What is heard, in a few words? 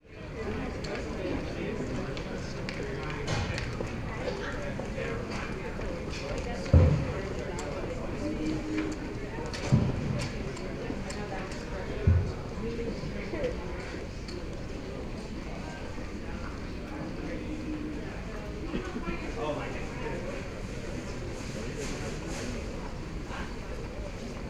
food court, ambient